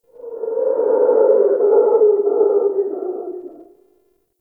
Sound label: Forest Creature Night Monkey Low Distant; Warframe; Grineer